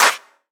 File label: clap single hit c sharp key; single hits one shots